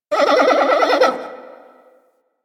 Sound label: forest creature night monkey medium; Ambience; Warframe; Grineer Forest